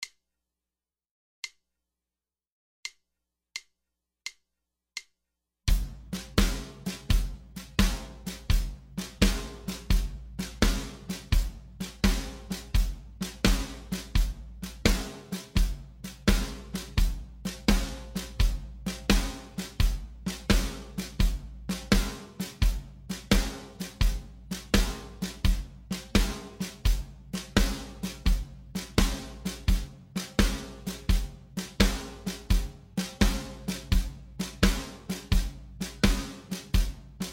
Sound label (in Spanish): BATERIA SHUFFLE 85